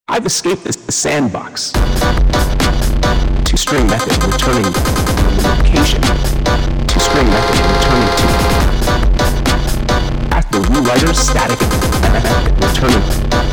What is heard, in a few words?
scramjet dubstep